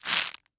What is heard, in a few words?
Forest Branches; Footsteps; HauntedBloodlines; SFX Producer